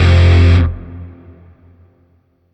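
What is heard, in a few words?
Shawzin Pentatonic Minor Chord C; Warframe; Grineer Shawzin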